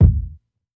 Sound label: KICK BLOODY; Kicks; drumkit